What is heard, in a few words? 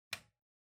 Light Switch Rocker, Wall Gentle